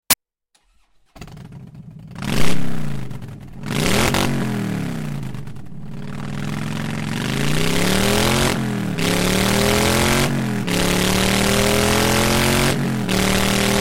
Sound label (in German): Dyna Open Linie ohne dB-Eater